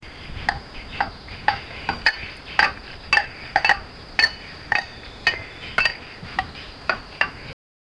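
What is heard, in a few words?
ancient egyptian dawn wind, workplace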